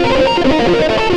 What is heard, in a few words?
xtra lead